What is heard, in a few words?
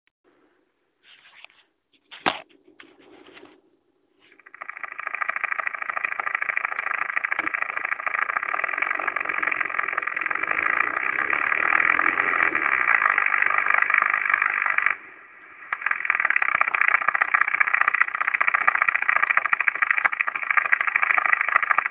Diesel engine noise